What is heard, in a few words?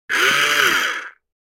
Electric cheese grinder; cooking